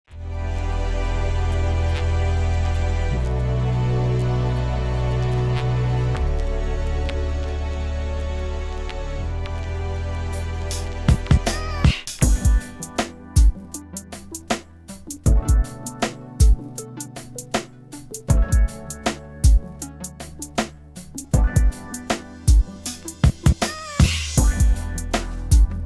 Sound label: Left Right Stereo Audio Test